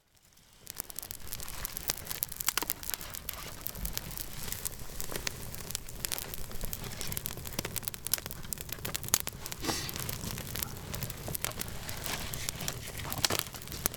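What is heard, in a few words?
late autumn forest, bonfire; 晩秋の森「たき火」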